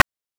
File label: PITCHED NOISE; NES DPCM; MIDINES